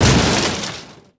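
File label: machine wreck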